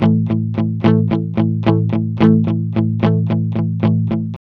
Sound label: ROCK A 110, Vintage Blues Guitar; USB Soundscan vol.22; AKAI